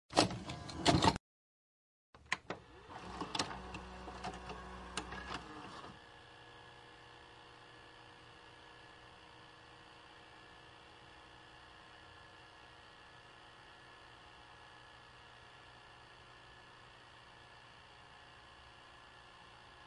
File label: vhs insert and read disc